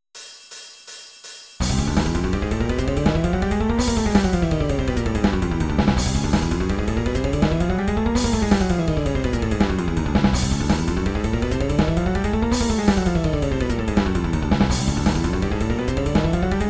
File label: Guitarra Chop Builder Cromatico 165; Gambale